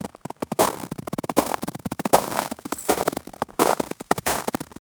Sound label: slow heavy crunchy footst; winter